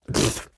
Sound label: Spew; Aporkalypse Pigs of Doom